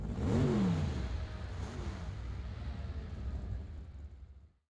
car Appears; netent; multipliermayhem

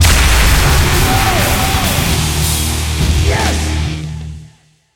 large win musical; netent

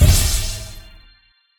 scatter Hit; netent; multipliermayhem

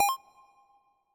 paytable Button; netent; reelrush

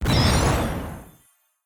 unlock Slot; netent; whenpigsfly

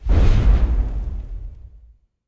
symbol Win Burst; netent; multipliermayhem

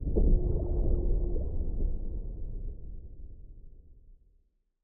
spin start; netent

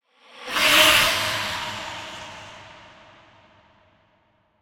character Win; netent; bloodsuckers2

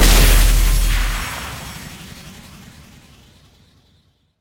big Win Text Change; netent; bloodsuckers2

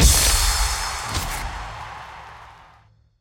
small win; netent

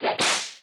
button Click; netent; deadoralive

